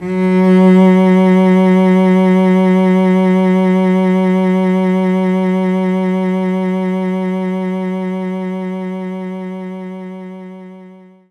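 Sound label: Cello F#2; knights try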